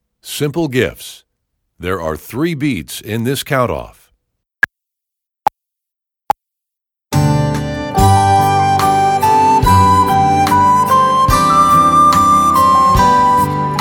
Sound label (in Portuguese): Simple Gifts Com Flauta Guia; Nivel 4; 4.o Ano de Escolaridade; Obra Obrigatoria; 9o concurso de flauta de bisel municipio de redondo